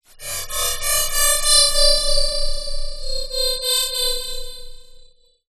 sound design frequency modulation evil sounding shrill moan or whine